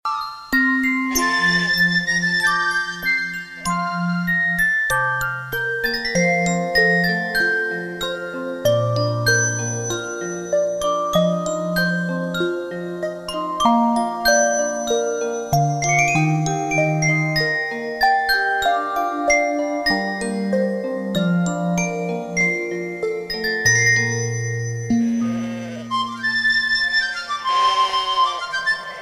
Slow Beat Song

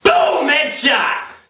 Headshot; Fps

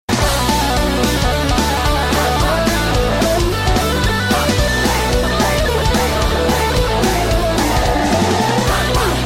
Nokia New Music

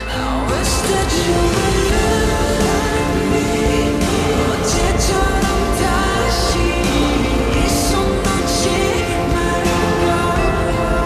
HEARTBEAT

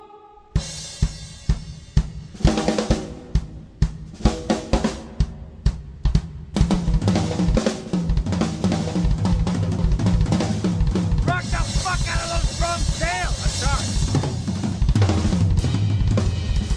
Drum Solo

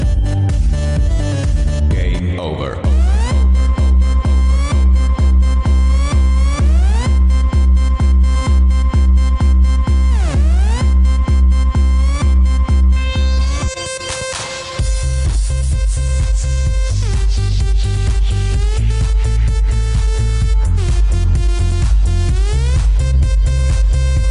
Game Over Electro

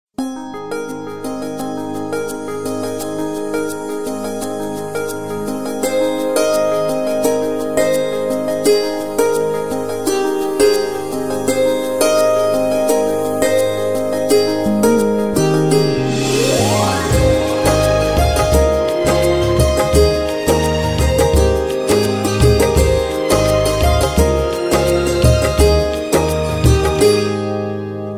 Best Romantic

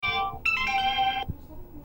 message; gta 5

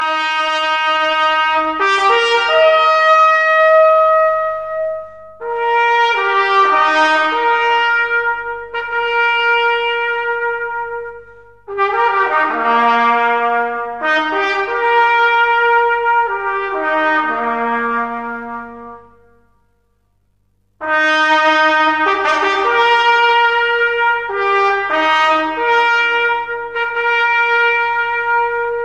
Trumpet

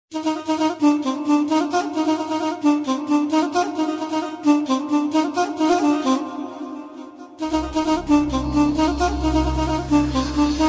Arabic Tone

